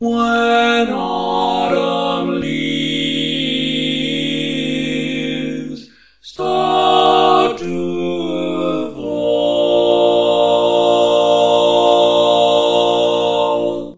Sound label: All Parts, barbershop